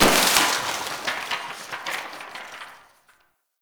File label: Desktop Book Destroy; Warframe; Ambience; Entrati